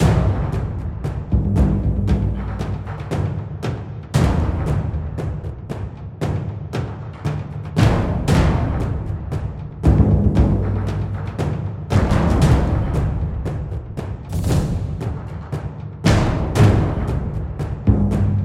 combat boss; biomutant; combat boss II level 1

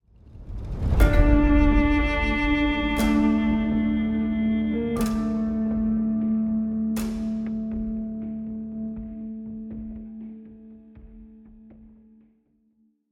Home Neutral Stop 60bpm; project zomboid

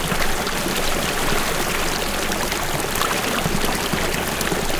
Liquid Slow Medium

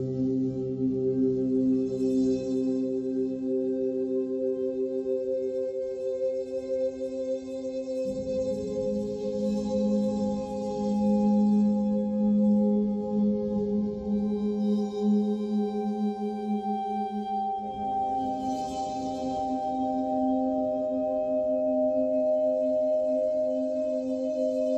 Neutral Dark Quick; Cat Interstellar

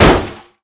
Break Metal

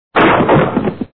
SFX Barrel Break; meco kong windows gamerip 2013

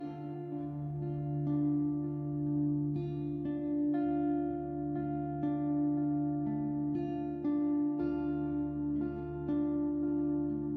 Palace Day Low Loop; assassin s creed chronicles india

